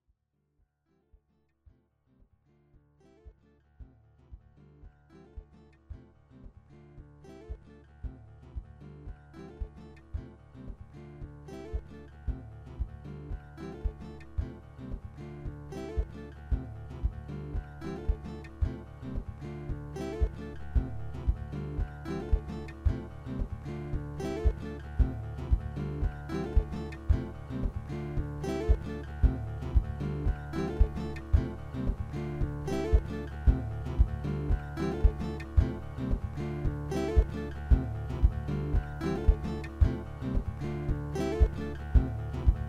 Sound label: drone dawn; aaron guest